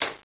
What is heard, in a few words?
BUTTON